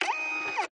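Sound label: Printer Printing Individual Cycle Servo Motor Toner Close Perspective; DPA4060; PMSFX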